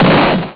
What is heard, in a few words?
boom; sc2